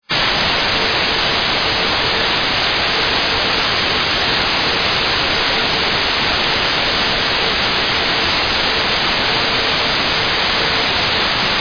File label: cw noise